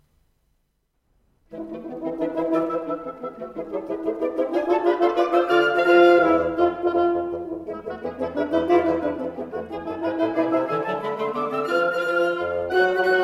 Prelude, wind quintet; Prelude no 2; Ivor Gurney arr Christopher Britton; New arrangements for wind quintet

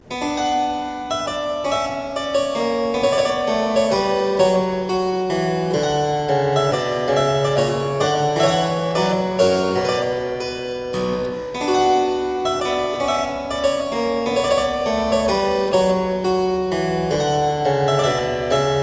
Suite in C Minor - Minuet, spoken, Keyboard Music; William Croft

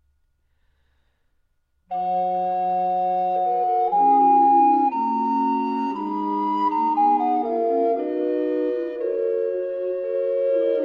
Lamentatio, Recorder Quartet; Ludwig Senfl

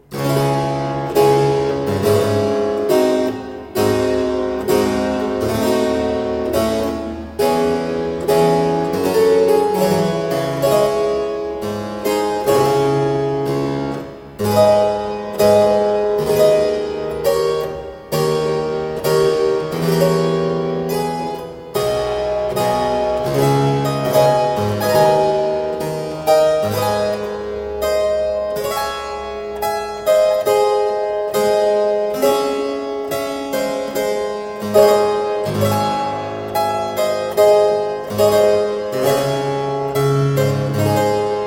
Trumpet Overture - Adagio, spoken, Keyboard Music; William Croft

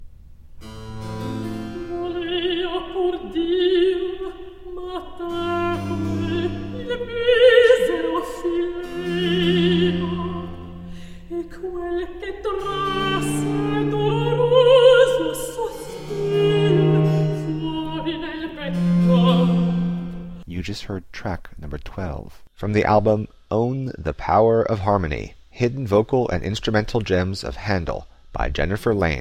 Cantata Recitativo, vocal

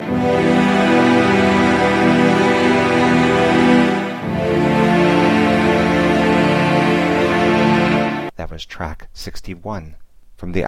116 G spoken, Rock Loops; soulprint; Loops For Licensing; MagnaLoops

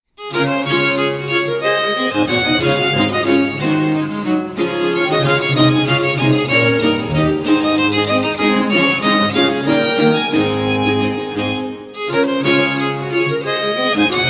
Courante, spoken; Mensa sonora Pars I (C 69); Simplicissimus Ensemble

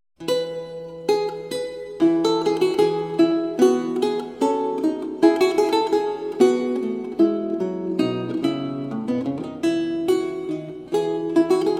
Recercar, renaissance lute; Recercar 6; Paul Berget; The Siena Manuscript

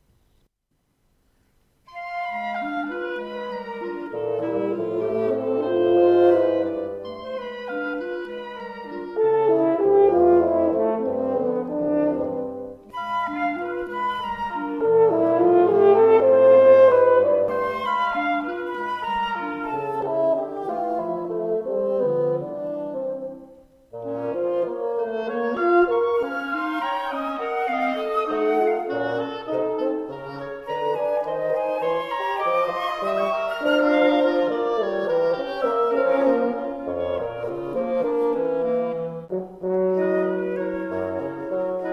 Prelude, wind quintet; Prelude no 1; Ivor Gurney arr Christopher Britton; New arrangements for wind quintet

